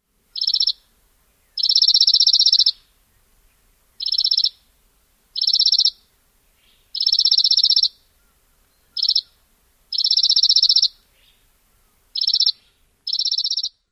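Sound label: rapid-fire dawn song, Chipping Sparrow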